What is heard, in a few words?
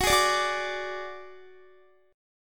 Gb Suspended 2nd Sharp 5th, Guitar; Gbsus2#5 strummed